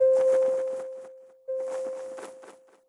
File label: Sfx Golf